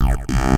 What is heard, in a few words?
incorrect